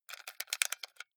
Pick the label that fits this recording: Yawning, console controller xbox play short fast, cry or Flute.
console controller xbox play short fast